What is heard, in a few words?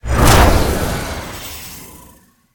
pwr lift; smite; tiamat